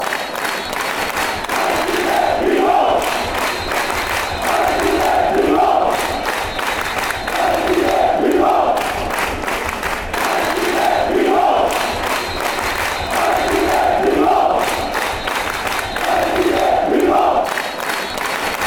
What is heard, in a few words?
athletic bilbao clapping